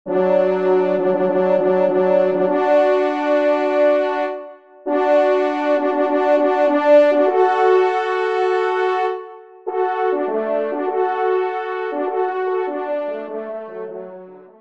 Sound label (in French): Grande Fanfare TRP; 2ème Trompe; Auteur : Paul Laugé